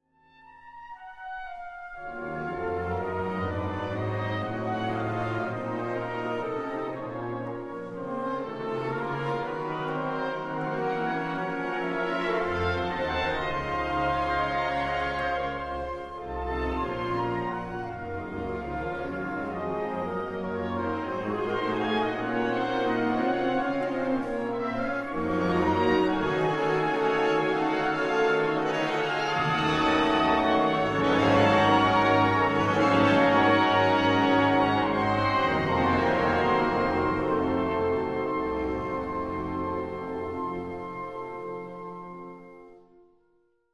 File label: triton II